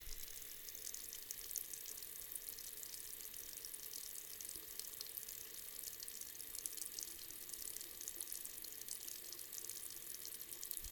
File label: Faucet